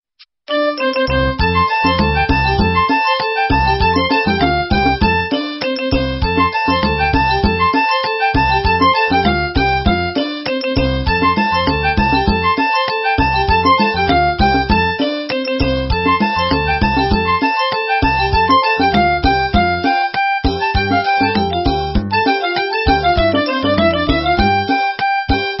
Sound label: Thai Musical Song